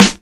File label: 00s short reverb steel snare drum sample g key